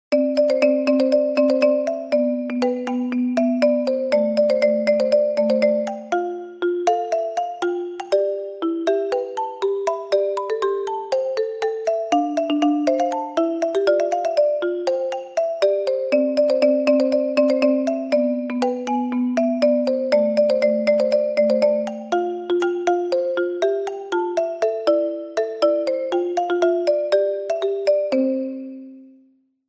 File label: ringtone